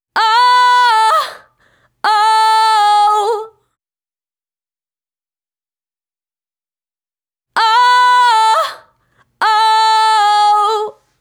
vocal oh oh whoa 130 Emaj

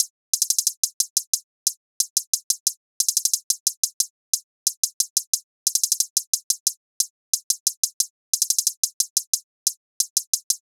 hihat loop 90 overtone

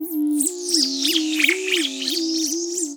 texture ominous hum

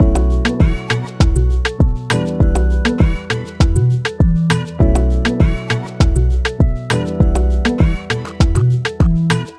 Beat 100bpm Bm Melodic Layered Soul

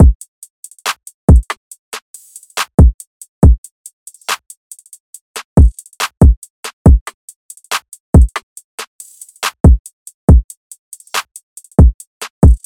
Drums 140BPM Bouncy Trap

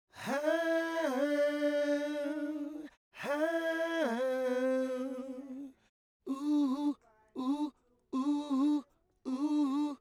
80 G maj D maj Male Harmony

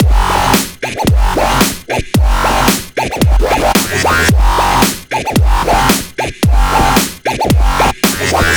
112 F- Music Loops

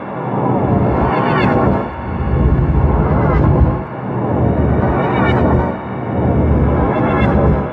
Atmosphere Dark Flutter B 124

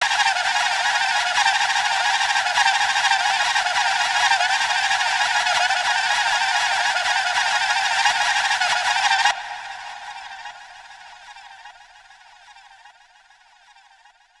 beat loop, 100

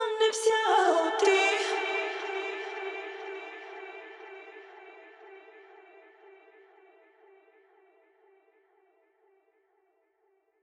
120BPM A AIRY VOICE

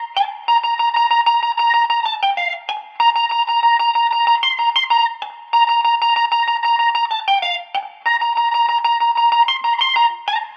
95 guitar hi Bb; wrangler

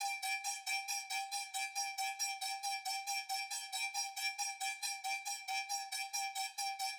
Perc, 137; Loop Legendary IV; Fm

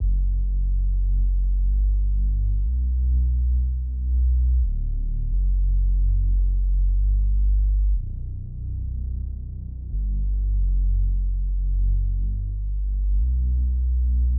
91BPM Bminor Bass

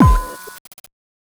Fx Perc Shots

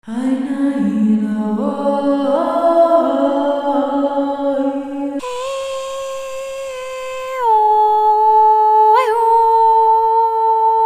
Voices Of The Vikings; Queen Chameleon

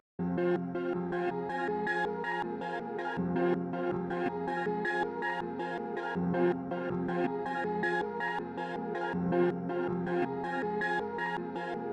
Melodic Sounds

